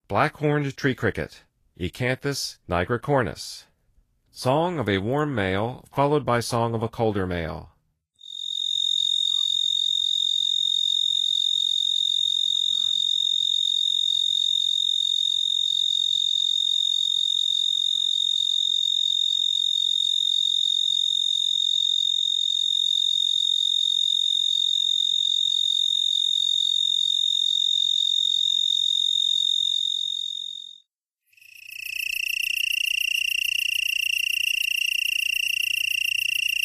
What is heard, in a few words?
Black-horned Tree Cricket; N. America